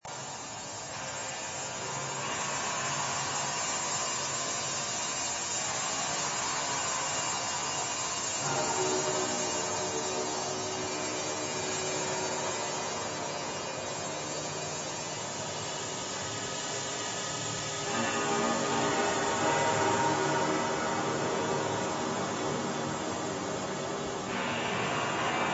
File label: Bertoia Clear Sounds